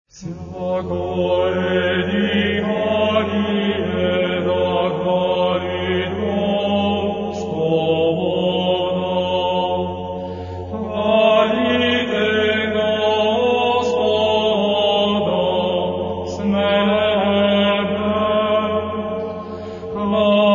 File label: Bulgarian Orthodox Chant; Изпълнения на български хорове - Bulgaria; Православни песнопения; Vsiakoe dihanie; Всякое дихание